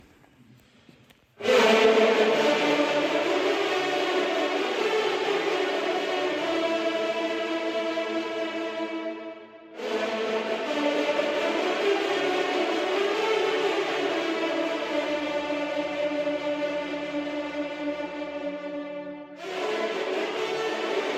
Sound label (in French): EGLISE MESSE 1ERE sonnerie interieur; CHEF BOUTONNE 79; EGLISE NOTRE DAME; MESSE SAINT HUBERT